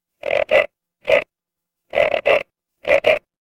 animal frog

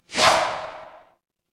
transition swoosh

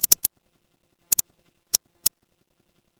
office mouse click

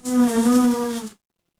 animal insect

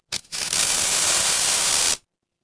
fire electric fuse

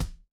retro game hit

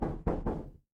door knock

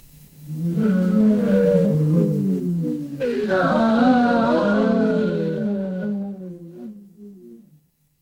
crowd gasp

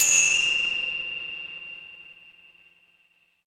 instrument chime bar

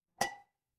glass ceramic

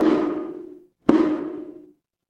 instrument wood block

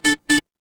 ui call end

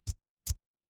feedback checkpoint

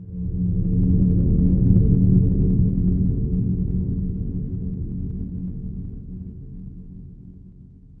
horror drone

